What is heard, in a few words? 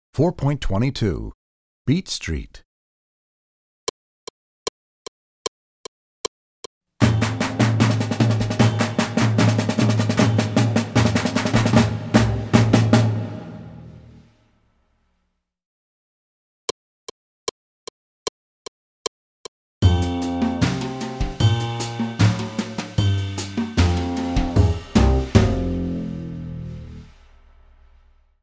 Beat Street, Percussion; Percussion Book 2